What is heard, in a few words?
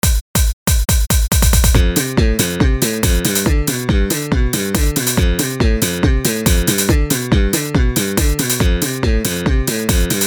Bouncy